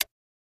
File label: Push Button Latch, buttons